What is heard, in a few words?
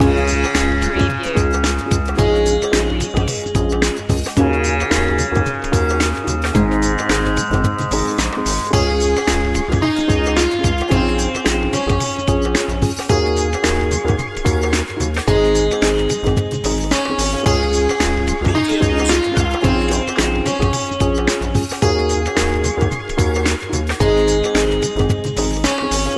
hindu pop loop